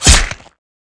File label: sword strike